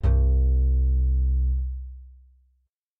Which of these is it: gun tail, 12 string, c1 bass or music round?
c1 bass